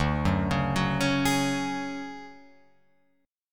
D Major 7th Flat 5th Guitar Open F, chord; arpeggiated

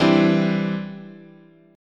E Minor 7th Sharp 5th, chord

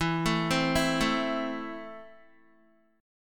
E Suspended 4th Sharp 5th, Guitar; Esus4#5 arpeggiated; 11,12,12,9,9,x

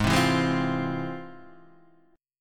F Minor Major 7th, G sharp

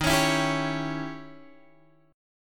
D sharp 7th Flat 9th, Guitar, Open G

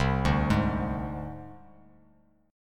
C Suspended 2nd Sharp 5th, Csus2#5 chord; arpeggiated; JGuitar

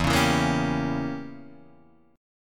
E Diminished 7th E, Guitar Drop D

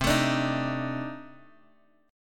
C Minor 6th Add 9th C down Guitar Open G

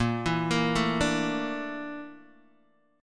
Bb Major 7th Flat 5th Bb Guitar DADGAD

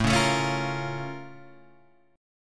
Bb Minor Major 7th

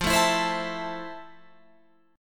F Major 7th, Guitar, Open F; strummed

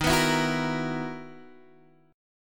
B Major 11th D sharp, guitar

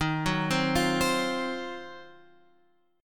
D sharp Minor 6th Add 9th D sharp down

